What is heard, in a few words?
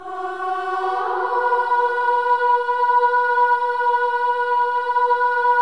Voices, INTERVALS, 4TH UP; Spectrasonics; Symphony of Voices